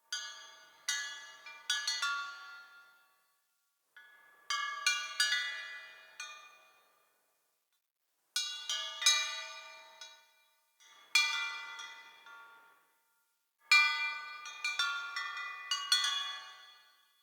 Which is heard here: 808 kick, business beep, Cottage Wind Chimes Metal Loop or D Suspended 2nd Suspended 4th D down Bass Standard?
Cottage Wind Chimes Metal Loop